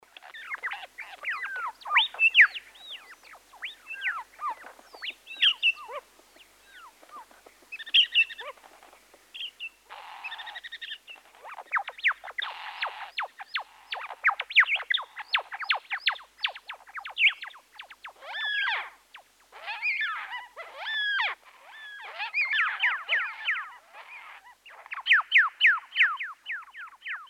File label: Busy Birds